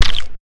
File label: footsteps splash